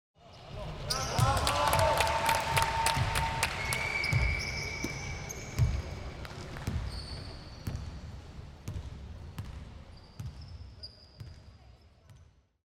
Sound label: Cheer, Basketball Blow, Whistling; Sound Ex Machina